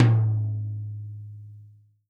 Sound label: TOM, Real Drum Kit, FUNK KIT